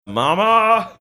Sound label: singing mama